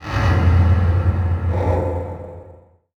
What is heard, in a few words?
Transition Hit Impact Drone; Production Element